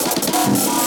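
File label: drum beat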